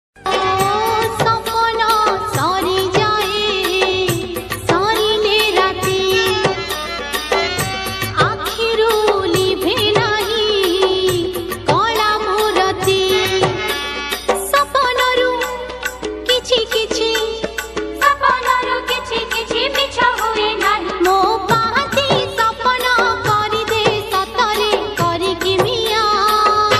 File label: bhajan ringtone; shree jagannath